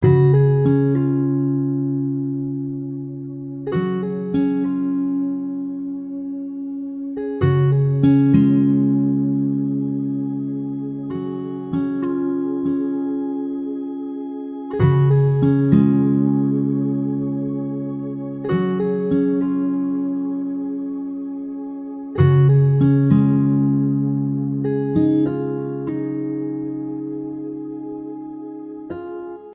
Acoustic Guitar; Storytelling Essentials

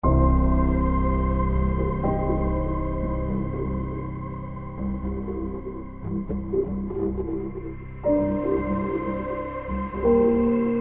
Suspenseful

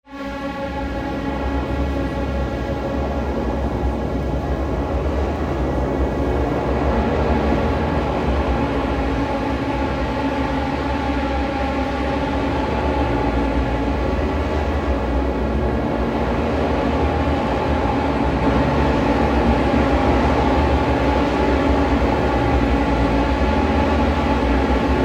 Thrilling Suspense

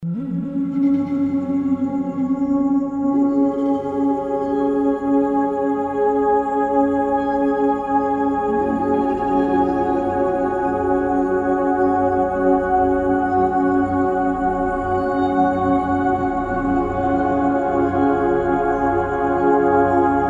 Beautiful Mysterious; Storytelling Essentials; Beautiful Mysterious Backing

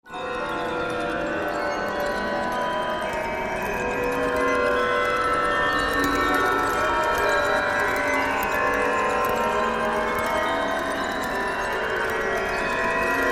Very Creepy Really Very Creepy

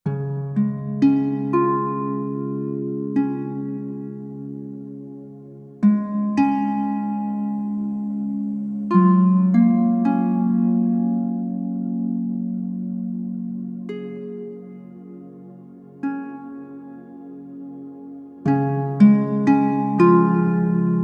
Harp; Storytelling Essentials

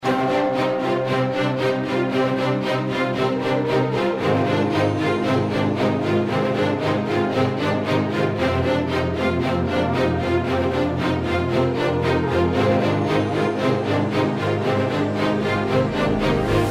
Epic Adventure Action; fast cinematic music

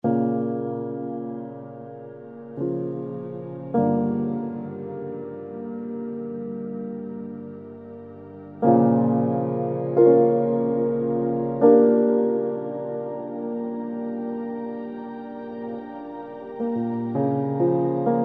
Washed Mystery Piano; Storytelling Essentials

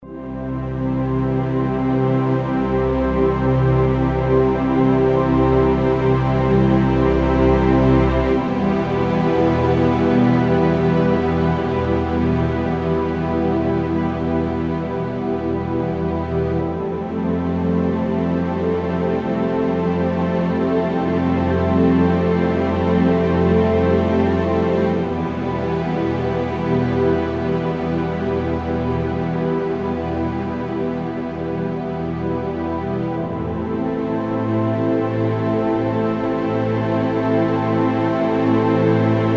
Orchestral Bed; Film Elements

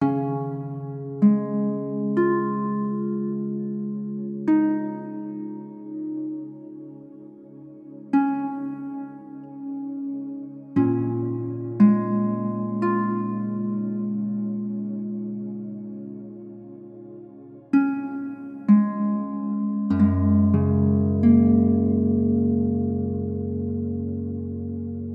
Harp; Storytelling Essentials

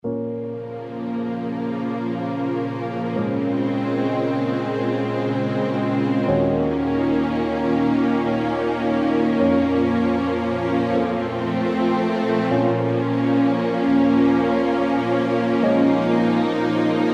Sad Cinematic

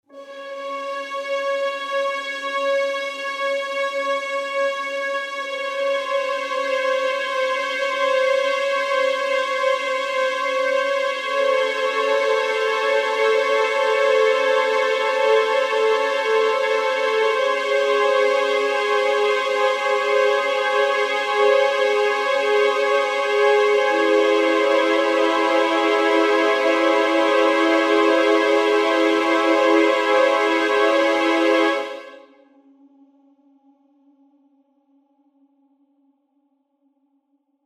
Classical Suspense

